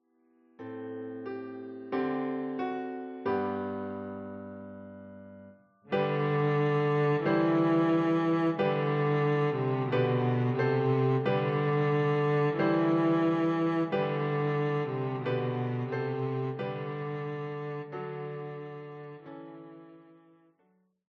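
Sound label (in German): O du froehliche, Violoncello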